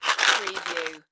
Push Pins Box Remove

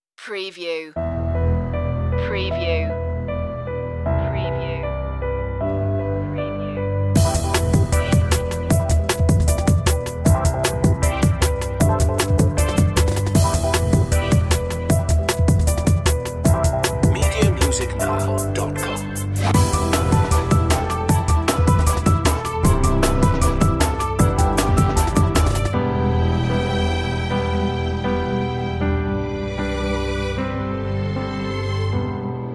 car advert music

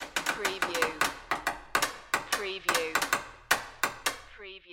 Wood Creak Heavy Long; Sound Effects Bible

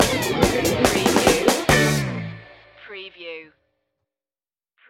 stomp stinger

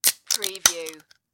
Soda Can Open

